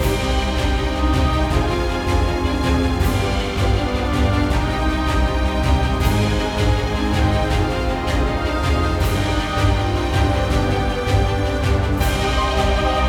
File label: Ominous; Grimlight: A Tale of Dreams